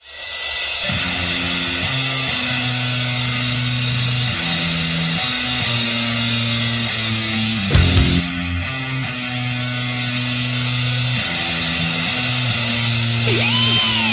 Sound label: Melodic Black Metal